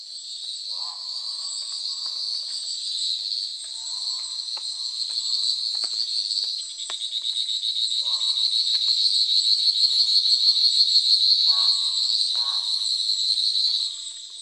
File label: litoria revelata; Whirring Treefrog